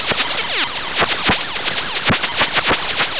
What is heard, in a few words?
battle